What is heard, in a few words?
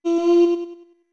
Notes Voice F med; OOT